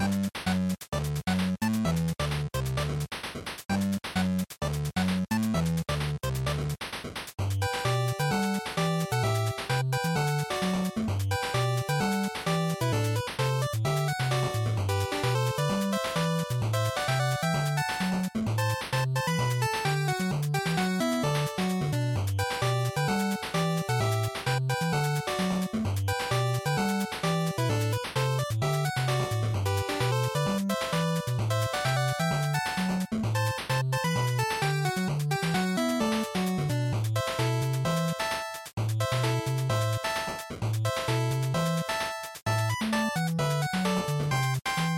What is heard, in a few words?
NES Action Stage Loop 130